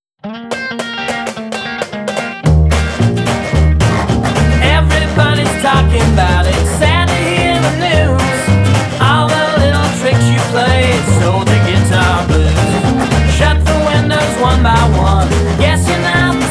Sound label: guitar blues